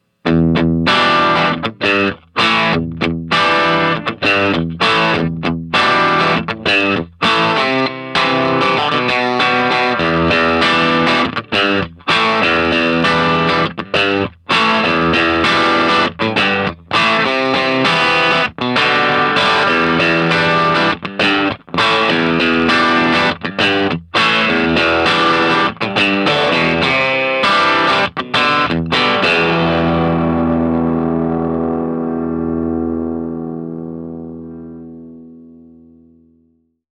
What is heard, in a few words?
Tele Bridge Drive